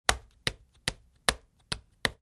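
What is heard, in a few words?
game of checkers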